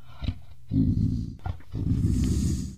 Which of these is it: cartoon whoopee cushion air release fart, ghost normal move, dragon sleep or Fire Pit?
dragon sleep